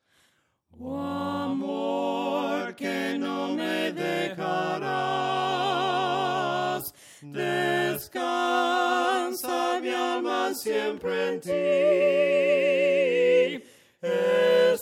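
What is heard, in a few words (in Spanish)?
OH AMOR QUE NO ME DEJARAS VOCES, himnos a capella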